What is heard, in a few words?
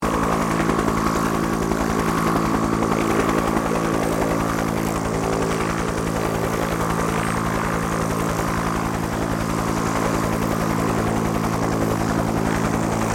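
helicopter Bell UH1 Iroquois idle; RAN UH-1 Iroguios; Helicopters (Sound Effects:Vehicles)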